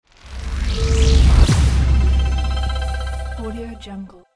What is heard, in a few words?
power logo